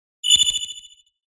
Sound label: Terminal; PMSFX